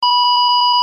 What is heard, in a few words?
Beep; Beep 5 - Medium; Museum Alarm Options